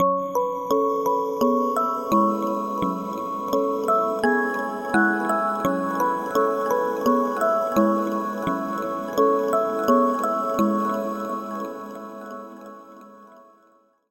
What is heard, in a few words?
Key; Routed Sound; Sylenth1 Expansion - Story Walker Vol.1